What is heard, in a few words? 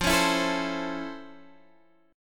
F Major 7th Flat 6th, F, guitar